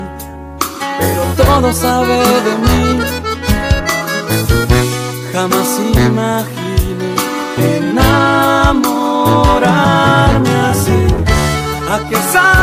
tus latidos

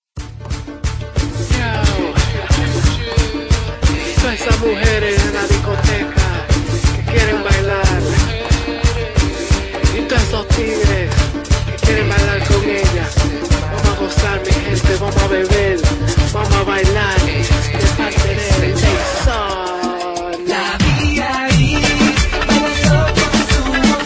merengue rapido

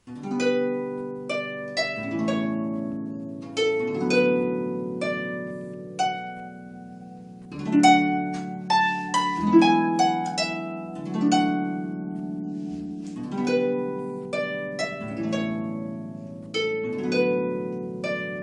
Arpa, ringtone

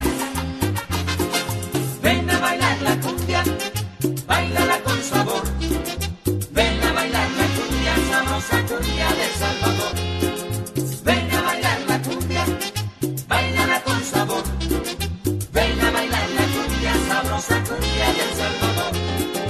cumbia

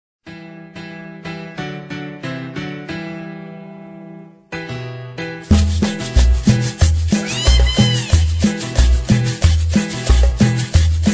rumba cha cha cha

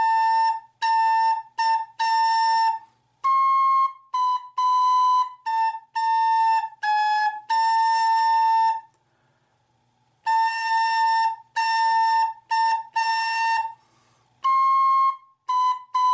funebre

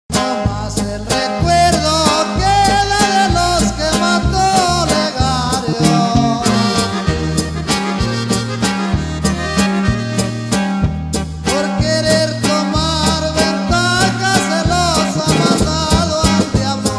corrido

